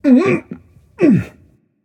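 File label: grunt lift, male; smite; voicepack; rama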